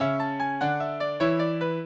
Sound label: minuet, mozart